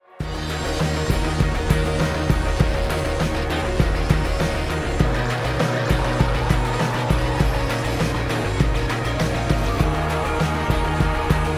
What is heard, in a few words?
Infernal Machine Raw; musicas para masterizar; Obscuresounds